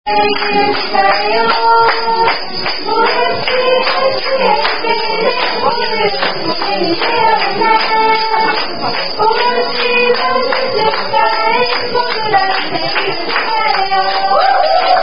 Korean Birthday Party, Korean Happy Birthday Song Sung By; Korean Birthday Party At Outback; Unknown Korean Happy Birthday Song Sung By Outback Wait Staff